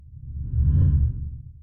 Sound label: Transition Whoosh